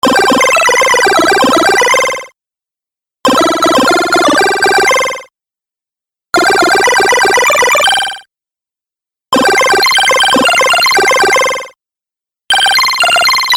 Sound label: Chiptune Melodic Phrases Collection; Musical Sound Effects